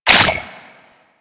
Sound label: CTF Pickup Flag; Warframe; Ambience; PVP